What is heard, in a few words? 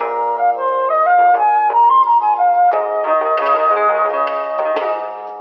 flute and guitar; Loops